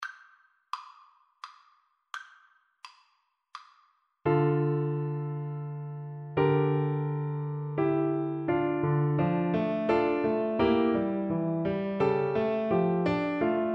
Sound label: Clarinet